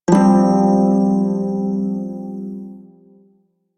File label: Prime Shawzin Pent Min Chord F; Music; Warframe